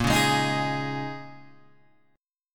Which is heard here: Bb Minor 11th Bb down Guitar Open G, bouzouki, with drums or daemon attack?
Bb Minor 11th Bb down Guitar Open G